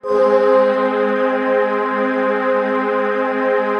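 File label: chord pad, Pads; Best Service; XX Large - Pads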